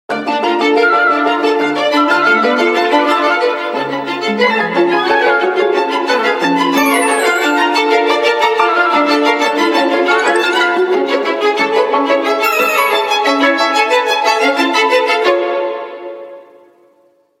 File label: vertu ringtone; impetuoso